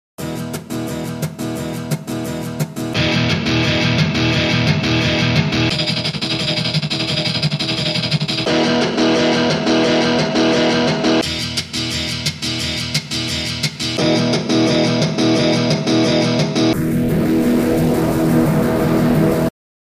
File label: guitar rig